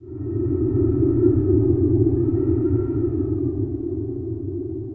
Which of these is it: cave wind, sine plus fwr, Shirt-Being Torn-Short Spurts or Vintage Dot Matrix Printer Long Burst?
cave wind